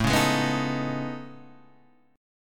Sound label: A Major Sharp 11th, Guitar, Half Step Down; AM#11